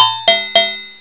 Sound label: cowbell 8bit pcm unsigned; sms; wav sample